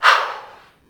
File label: gun blow; deplume